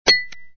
ping

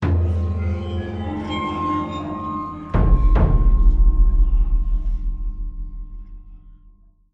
gothic message

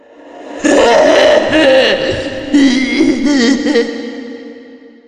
laugh psychotic female

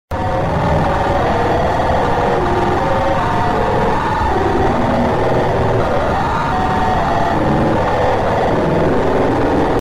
screaming; roblox doors rush

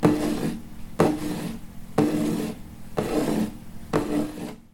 brushing wood

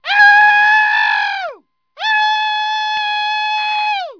people

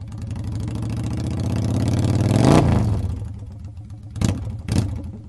motorcycle engine

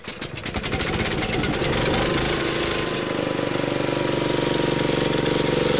nautical

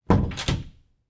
Door Closing

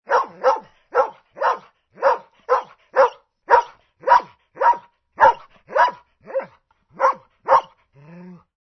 ANIMAL DOG

